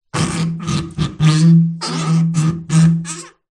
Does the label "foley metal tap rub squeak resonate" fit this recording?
Yes